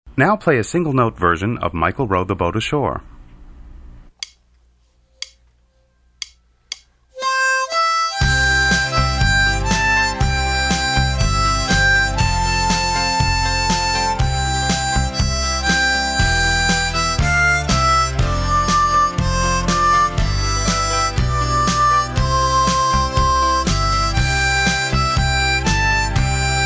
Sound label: harmonica